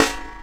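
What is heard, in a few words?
snare drum, Drum & Bass, 160 BPM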